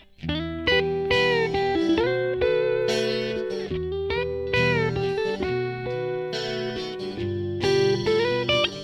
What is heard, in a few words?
guitar